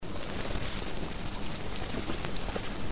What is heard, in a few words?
SW, dolphins signatures; Pashosh